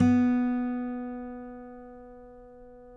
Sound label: COLLINGS B 2, Guitars; Spectrasonics; Hans Zimmer Guitars VOL-2; FNGSTYLE PFM